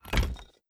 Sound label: monkey Place